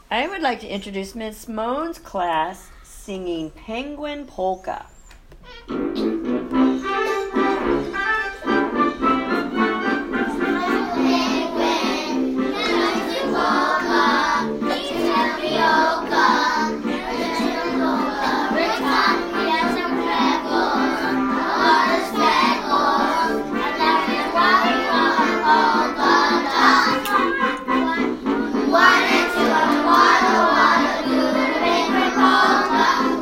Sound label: penguin polka